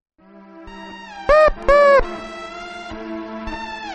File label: noot noot otomatone